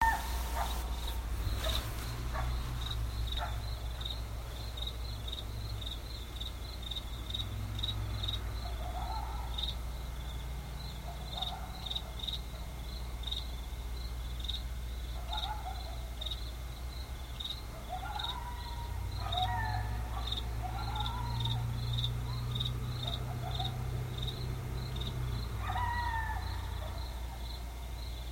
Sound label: coyotes